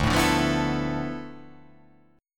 D Minor 6th Add 9th D

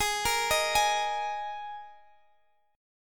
D sharp Suspended 4th G sharp

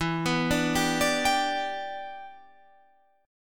G 6th E

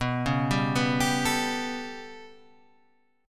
B 7th Suspended 2nd Sharp 5th down, chord; B7sus2#5 arpeggiated; JGuitar

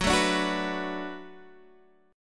B Minor 7th F sharp, chord

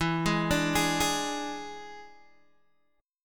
E 7th E down Guitar DADGAD

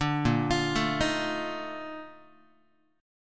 Bb Major 11th Guitar, chord; arpeggiated; JGuitar

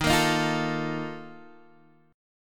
Bb Major 7th Suspended 4th, Eb, chord

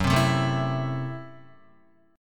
Gb Minor 7th Flat 5th, Guitar; Gbm7b5; strummed; 4F,4A,5C,5G,5C,6E; 1,3,0,2,0,0

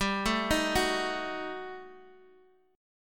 Eb Diminished G, guitar; Ebdim/G arpeggiated; JGuitar

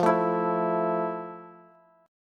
F Major 7th F, Bass; strummed; JGuitar

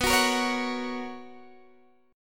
B Major 7th Suspended 2nd, chord

